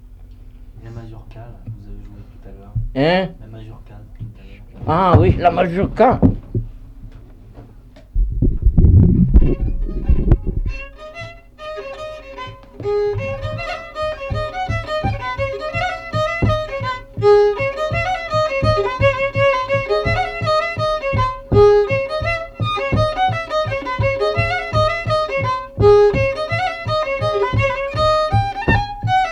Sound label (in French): mazurka; Gatignol